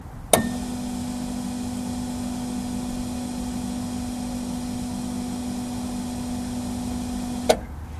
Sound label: Fuel Pump